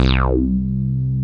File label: SYN MIDDLE, dance synth; Sound & Vision - Gigapack I CD 2 (Roland)